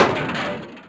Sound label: Hit Fence; Test Drive Off-Road 3